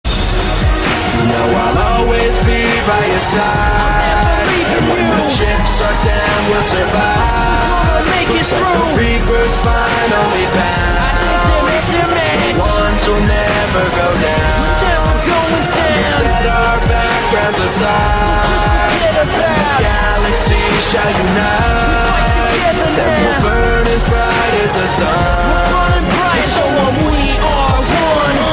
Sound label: Rap; Mass Effect